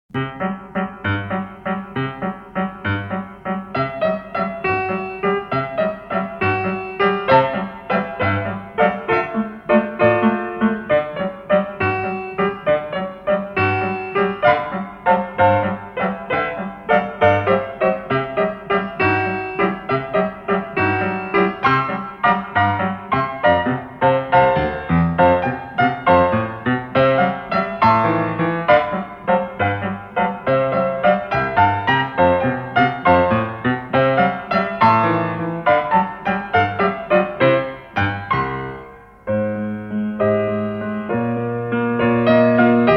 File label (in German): Lustiger Walzer